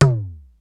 JD-800 KIT

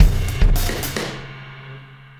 Wormhole Beat, Processed Drumkits

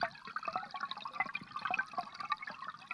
Waterway Dark, Water; outdoor

